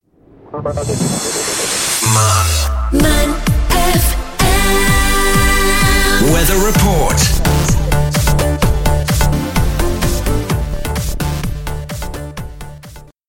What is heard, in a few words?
Weather Intro Bed